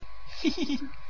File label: hihihihi